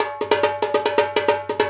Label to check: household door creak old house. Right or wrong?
Wrong